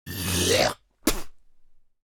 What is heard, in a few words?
Human mouth gargling and spit